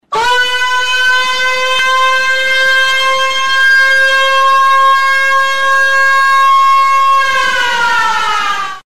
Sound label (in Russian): sirena; rambro